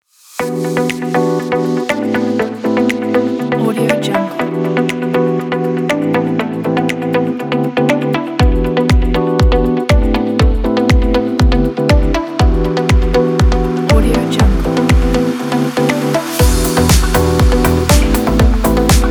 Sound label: the upbeat fashion lounge corporate